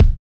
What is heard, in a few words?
Hiphop kick; Reverb+EQ+Comp+Limit